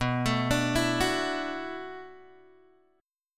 B Minor 11th B down Guitar Open G

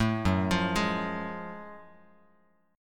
F sharp Minor Major 9th, Bass Standard; arpeggiated

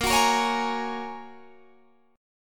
G sharp 7th Suspended 2nd Suspended 4th; 18,21,20,18,21,18; chord shape

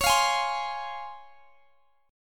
Db Major 7th; strummed

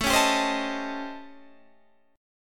A 7th Sharp 9th Flat 5th

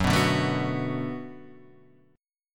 D 6th Gb, chord

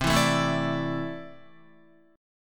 C 6th Add 9th C down Guitar; strummed; JGuitar

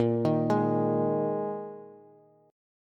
Bb 7th Suspended 4th Bb Bass; arpeggiated; JGuitar

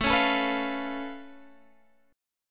C sharp Augmented 7th A, chord

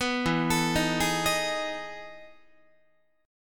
E Major 11th E, Guitar; EM11 arpeggiated

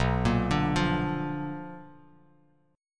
B Minor Major 7th Flat 5th Bass Standard; arpeggiated; JGuitar

